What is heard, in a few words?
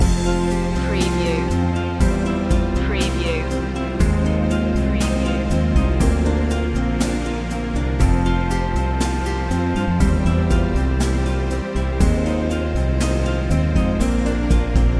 cold summer nights loop